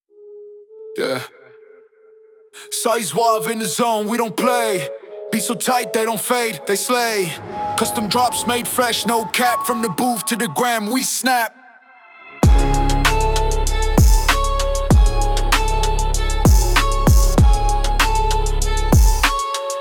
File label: Anthem Jingle